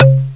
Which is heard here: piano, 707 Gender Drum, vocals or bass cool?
707 Gender Drum